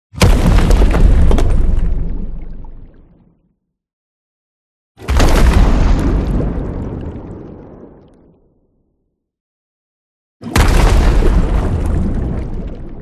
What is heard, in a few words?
CINEMATIC IMPACT Eruption; smartsound